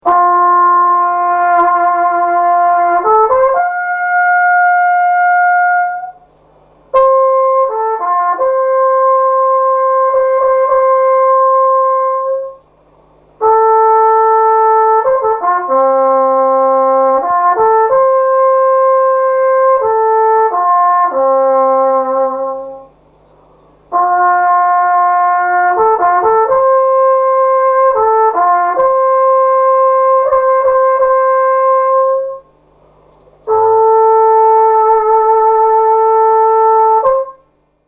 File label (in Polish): trabka